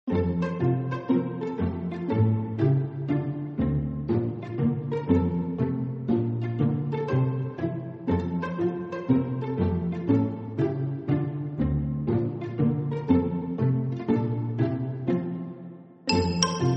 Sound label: background Music; ludo